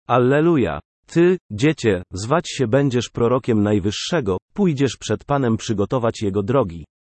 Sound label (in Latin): Alleluia Tu puer propheta